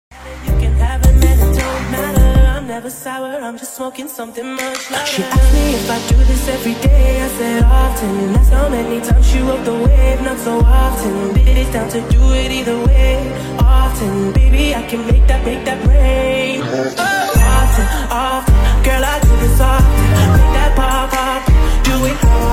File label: remix ringtone